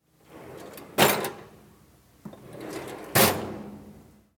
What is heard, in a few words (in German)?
Schublade